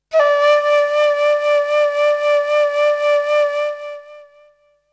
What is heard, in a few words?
Flute; ZERO G - Datafile DISC 3